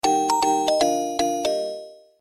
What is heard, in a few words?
clear message alert